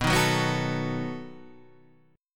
B 7th B down Guitar Standard

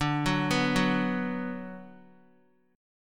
D 6th D down Guitar, chord; D6 arpeggiated; JGuitar

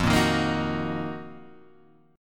Eb Minor F; Ebm/F strummed; JGuitar

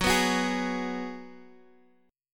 Gsharp Minor 7th; 14,14,16,13,x,x

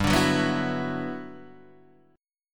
G Major 13th, Guitar DADGAD

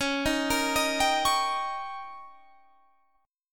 D sharp 7th C sharp, guitar

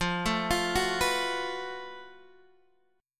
F sharp Minor Major 7th Double Flat 5th F

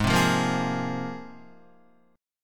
Ab 6th Add 9th, Guitar; strummed; 4,3,3,3,5,3; JGuitar

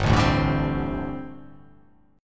G Suspended 2nd Suspended 4th down; Gsus2sus4 strummed; JGuitar

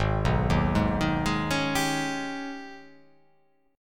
G sharp Minor Major 11th

